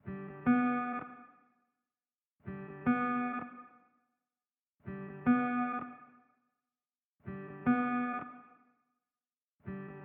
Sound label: Ibanez